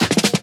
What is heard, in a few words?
Loops Fills; Vengeance Samples